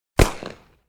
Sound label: gun machine gun; NCH Library